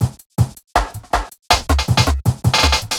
Break, Drum N Bass Percussion; Sonic Foundry (Sony Creative Software); Knutrix Drum & Bass; Fills n Breaks

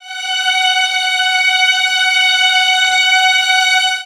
STRING LICKS, Disco; Best Service ProSamples vol.48 - Disco Fever; AKAI

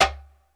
PERC, ETHNIC DRUMS; Crypton - DrumBase5000

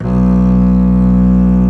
B1 arco bass; Northstar - New Gold VOL-2

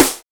ooof snare; Drums In Your FACE!

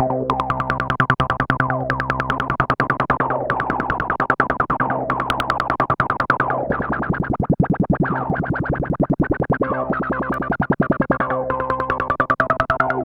Knob, Industrial

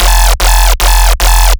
BASS SHOT scream 3rd

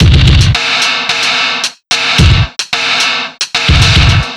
Noise Beat; Drums & Drones

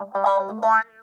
box wah, funk guitar; Zero G - Funk Guitar

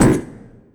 Oneshot; Sonic Foundry (Sony Creative Software); Esoterik Beatz; Messup

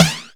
Flange Snares

